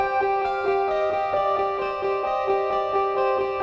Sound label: song